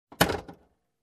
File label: Car compartment rattle